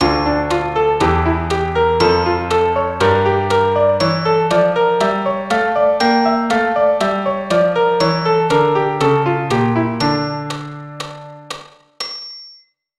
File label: Dm harmonic